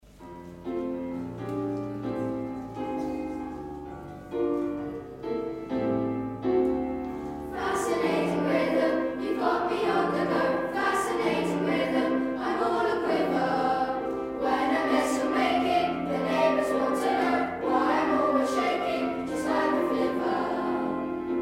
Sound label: chamber choir